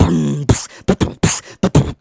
Beatbox Loops, Human Beatbox

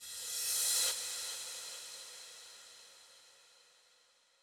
ReCrash 138BPM; Sound FX; F major; Vengeance Samples; Another Day Kit

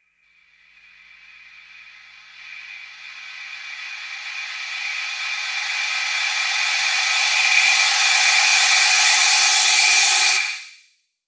FX Uplifter

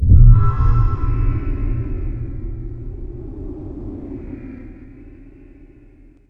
VFX Atmos

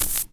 Minimal Perc; Minimal Percussion

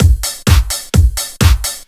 Housebeats 128BPM; Vengeance Samples

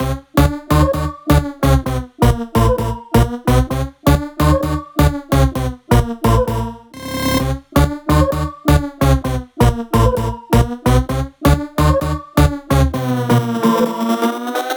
Melody 1, 130BPM; Take Top Melody 1

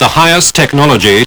Vocal Phrases; Vengeance Samples; Vocals

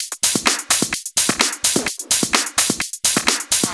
Houseloops 128BPM; Vengeance Samples

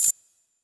hat, hats; Dubstep; Dubstep Sample Pack

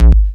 Synth Shots; Vengeance Samples

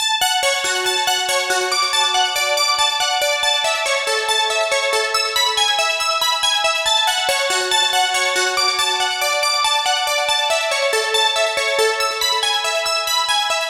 Bells, 140 BPM; Vengeance Samples; Vocal-Hook Kits; Song Kit; Stop!

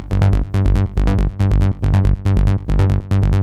Bassline WET 140BPM, G minor; Vengeance Samples; VTS1 Devastating Truth Kit

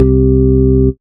Bass C, Offbeat Bass

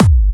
Bassdrums UK Trance Kicks; Vengeance Samples; VEC2 Bassdrums

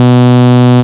Synths H; Vengeance Samples

